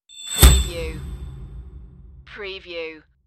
bright impact

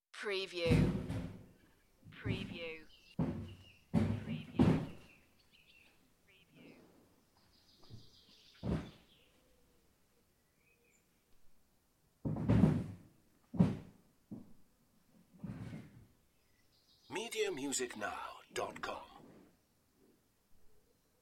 Clattering in a rural farm yard; beanstalk audio

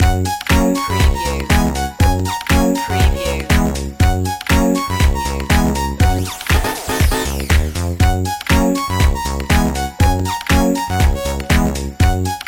retro video game

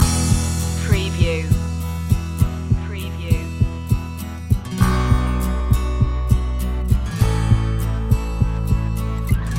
positive flow loop

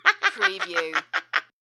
Old Lady Laugh

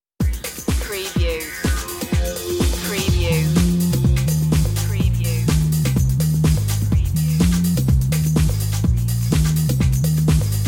trip minimal

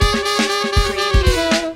funky feet stinger

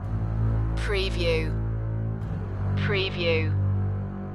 epic intro loop

